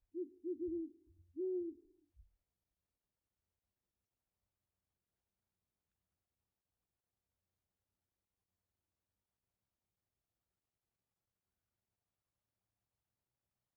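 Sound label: owl sound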